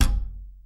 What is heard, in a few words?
acoustic bass klick; Masterbits CLIMAX 7 - Bass